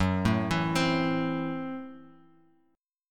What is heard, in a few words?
Gb Minor 7th Flat 5th, Bass Standard; arpeggiated; JGuitar